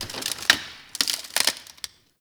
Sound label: Ext Branch Rustle, Ambience